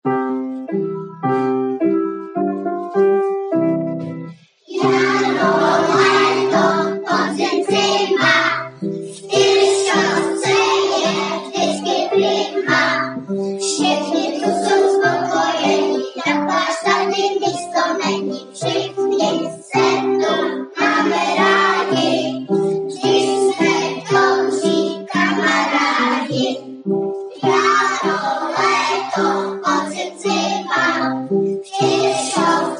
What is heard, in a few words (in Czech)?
hymna